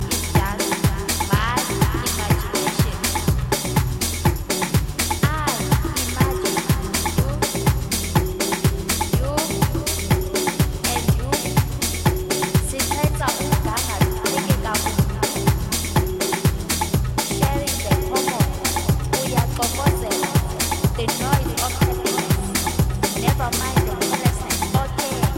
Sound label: Vocal